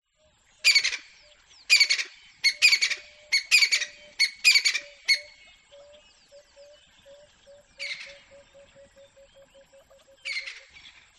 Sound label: Bird Crested Francolin; audio hero